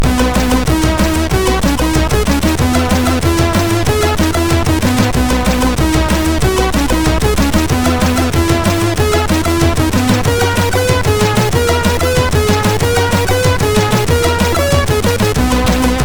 Rock, MOS6581R3

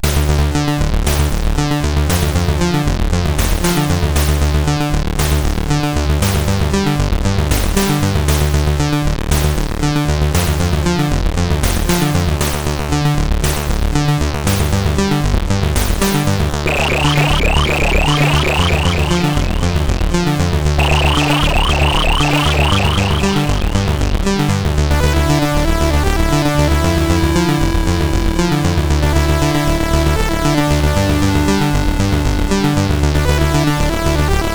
Party Song, sid CSG8580R5

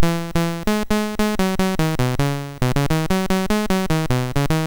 BASIC, MOS6581R3

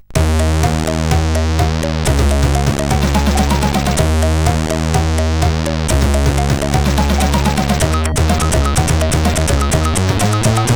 tune, CSG8580R5; Noisy Pillars tune 3

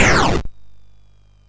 Frantic, MOS6581R4

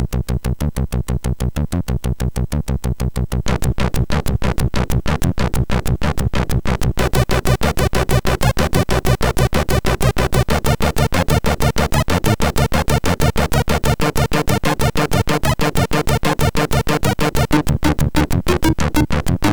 Techno, sid MOS6581R4; hvsc

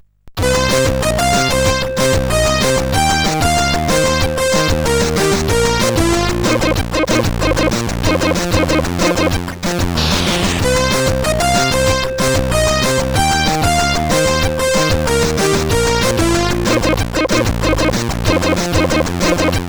Tracker, CSG8580R5

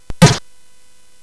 Tecnetium, CSG8580R5; hvsc

